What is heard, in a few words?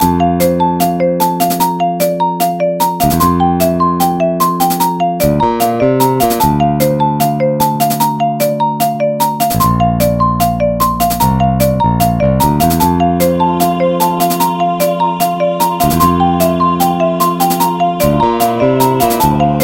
Password Screen